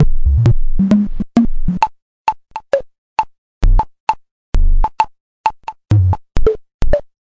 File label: quirky mad scientist, LOOPING AMBIENCE